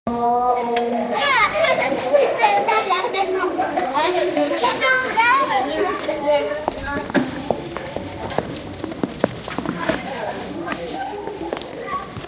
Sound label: a private school in engla